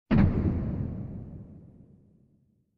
Warehouse Door slam

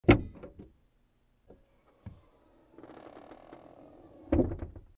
Car Trunk Opening

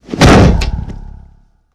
Body Slam on Metal Door

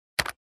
Computer keyboard button press

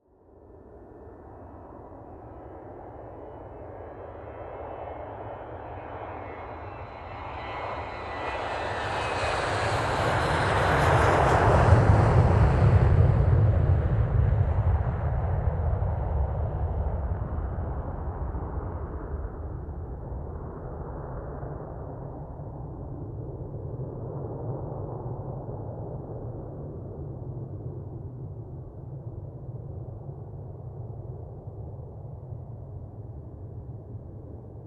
Aircraft Taking Off Whirring Sound

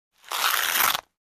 Ripping off sound effect

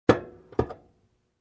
Unlocking engine hood

